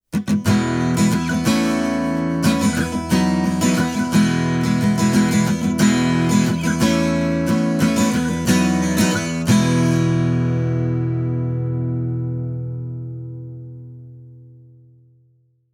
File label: Westerngitarren Stagg SW210CS solo; Rockway